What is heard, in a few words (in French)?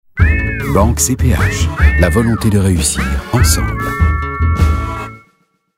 Jingle